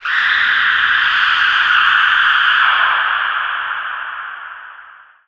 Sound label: female scream; Garry's Mod; tf2